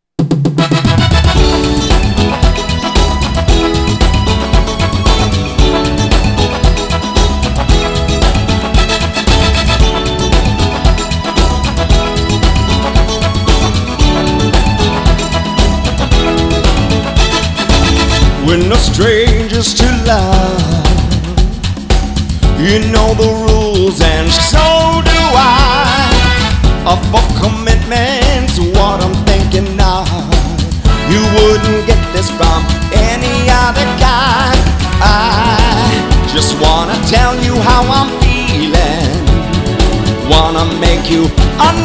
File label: Singer; Las Vegas Singer